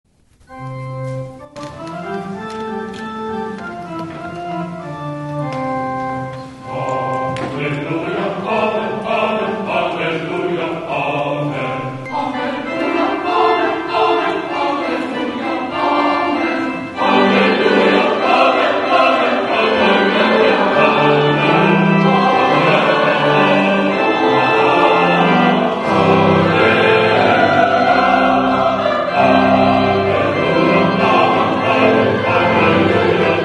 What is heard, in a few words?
Hallelujah Amen